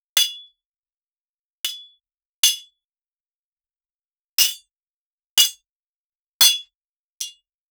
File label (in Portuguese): uma armadura medieval sen, swords